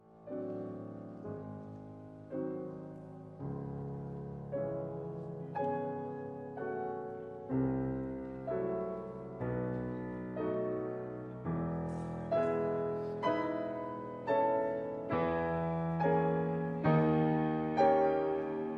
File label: prelude; rachmaninoff